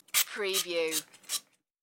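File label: Hair Spray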